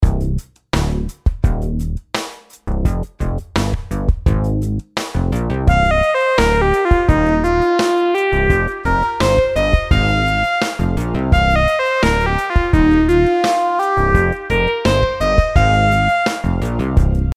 rhythm simple 8s triplets

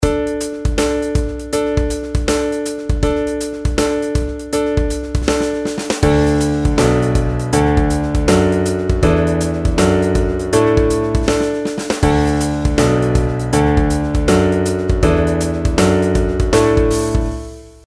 pitch patterns skipwise

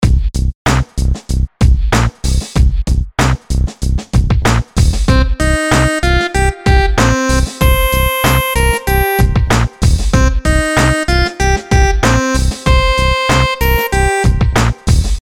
rhythms dotted eighths; simple dotted 4s

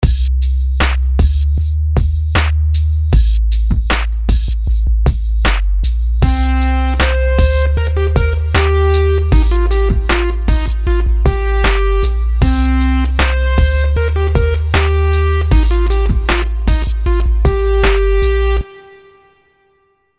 rhythms simple 16ths

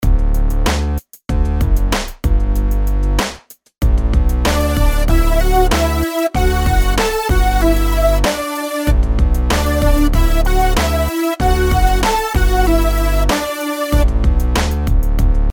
melodies simple Dmaj; melody

